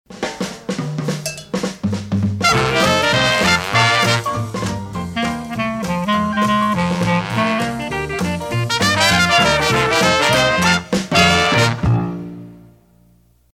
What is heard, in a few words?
Dixieland; KNOW